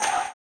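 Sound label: tambourine, 160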